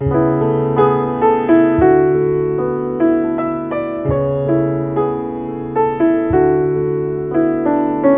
tom

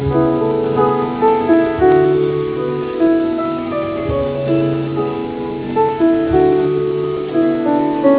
musicalo

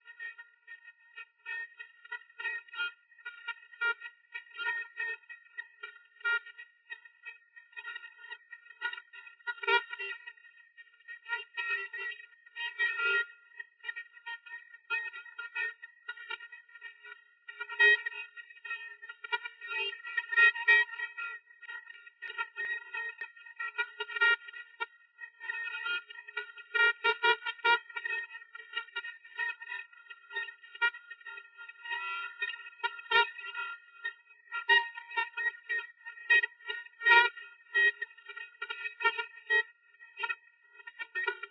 Jazz

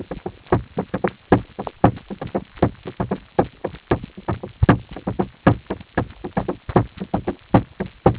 percussion

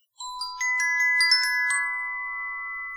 denoising glock 20dB

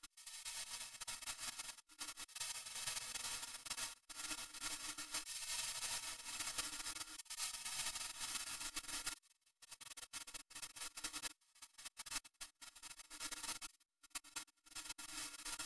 piano NMF

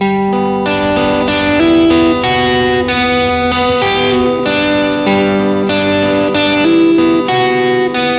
guitar

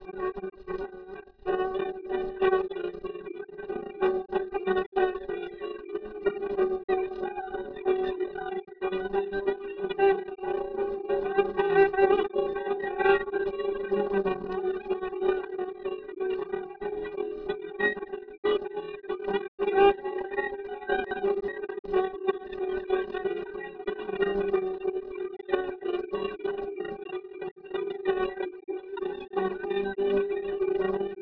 Jazz

